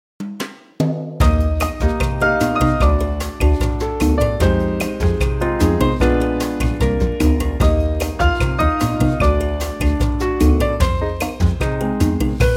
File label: Eb Latin